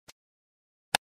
Computer mouse long click

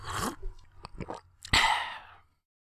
Drinking with a swallow and ah

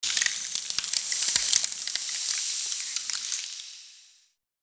Beverage ice freeze commercials ads tv; Film and TV

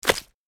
Worm falling impact on surface; slug and worm

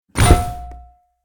Shield impact clang hit

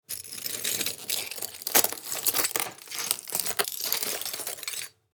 Glass micro gesture movement

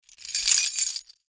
Keys handle and rattle; locks keys

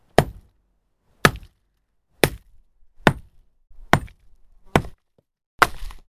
Breaking rock with a hammer

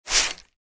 Barber shop spray bottle spraying

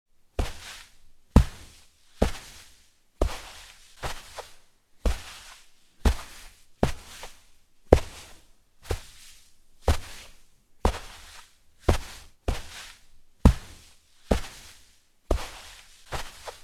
Footsteps walking on sand barefoot

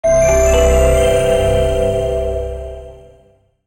Christmas logo intro effect ident

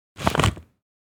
Plastic bag put item; gfxsounds

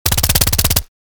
Thompson submachine gun short burst; war

